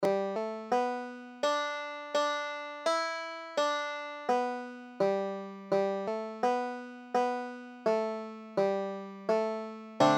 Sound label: oh susannah, banjo